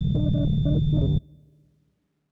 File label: low drone; ゆめ2っき